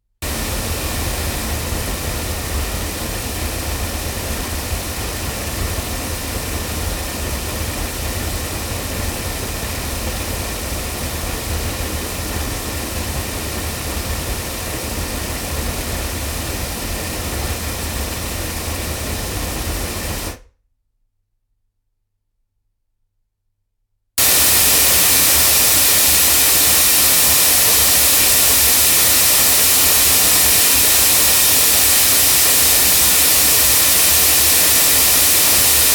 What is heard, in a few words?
ADK A51S Model S loudspeakers, microphones recordings